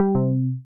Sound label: sent